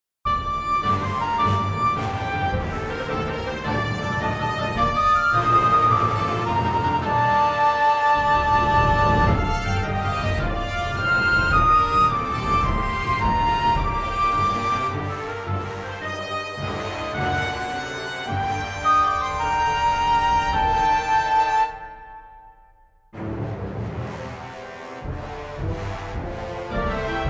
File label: F Orchestrated